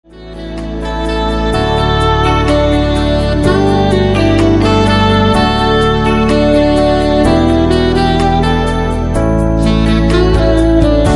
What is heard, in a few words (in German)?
instrumental